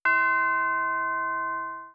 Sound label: chimes